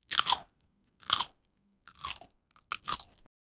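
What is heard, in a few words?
exaggerated chewing ketchup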